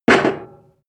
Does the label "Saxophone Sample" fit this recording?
No